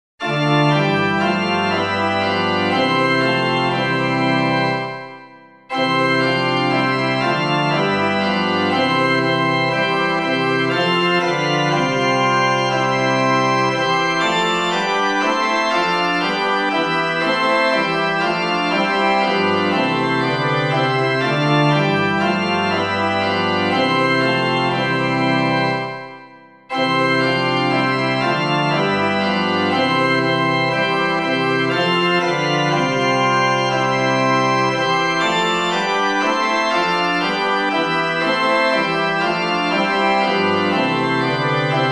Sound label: organ, hymn; Dunstan